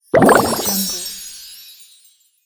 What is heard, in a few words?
sparkling bubbles